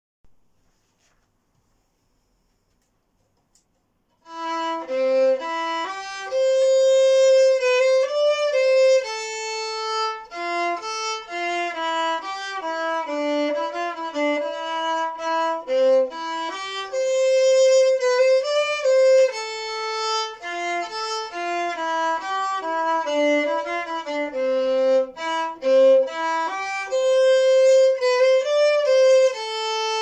breton waltz

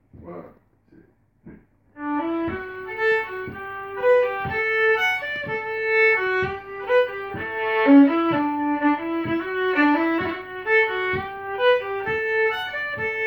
hakkis polka

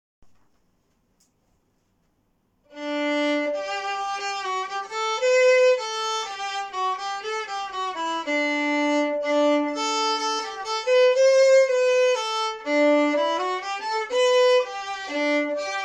breton waltz valse

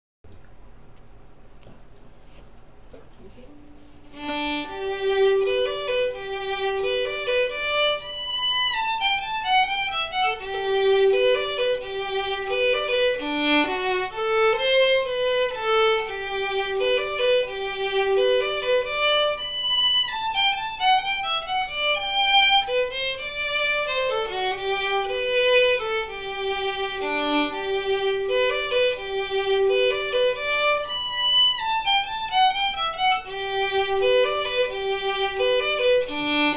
waltz

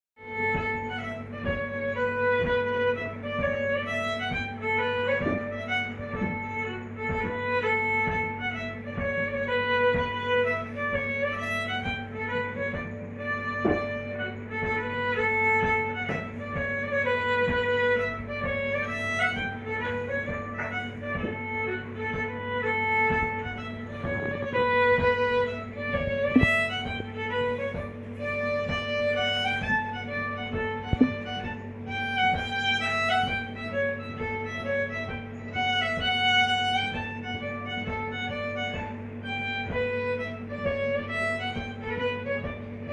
the old polka

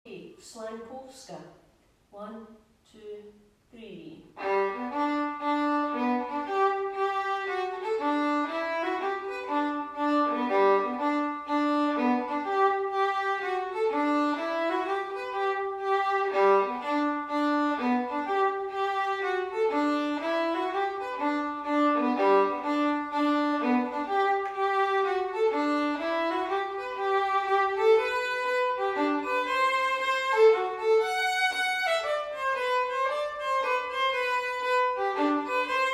slangpolska

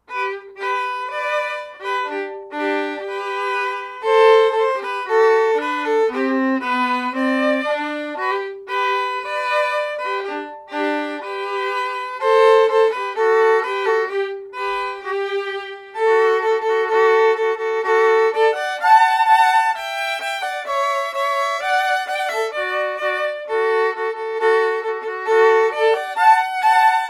smedens with harmony

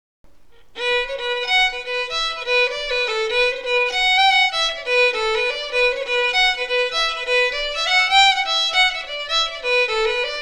jig

